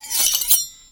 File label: Fork Attack